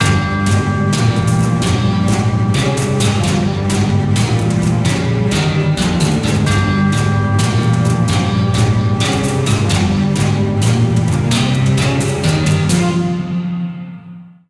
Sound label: Panic Loop; west of loathing